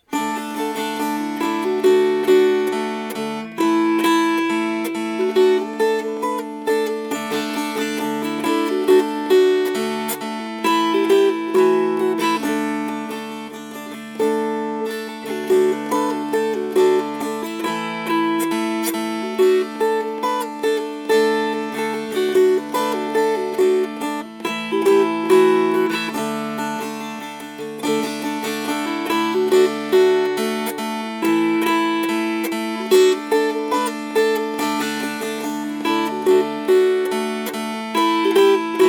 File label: Strummed